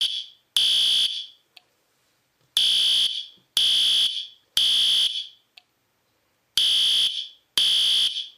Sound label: fire alarm